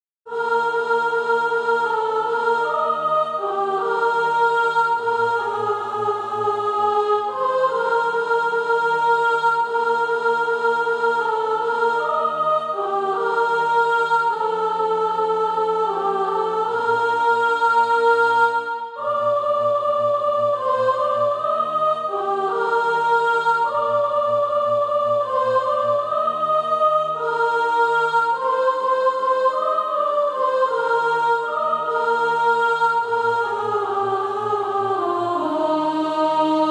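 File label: sop, Practise Music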